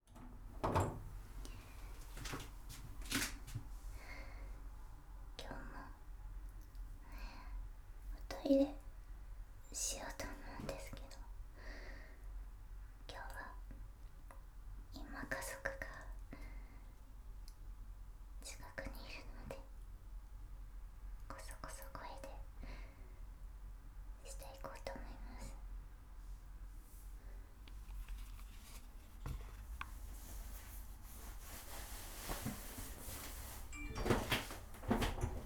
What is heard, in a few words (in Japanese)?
おしっこ; 4日目のおしっこ; 寝起きおしっこ11日分